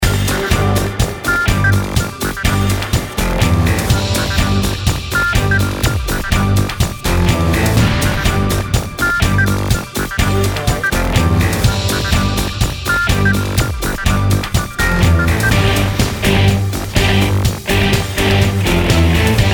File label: Robot Factory